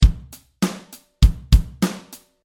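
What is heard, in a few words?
eighth note drum grooves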